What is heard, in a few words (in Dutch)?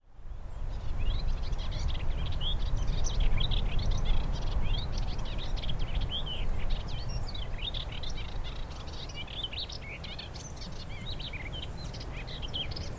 Orpheusspotvogel; Westhove